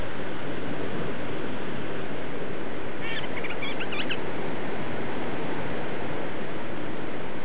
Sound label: Madeiran Storm petrel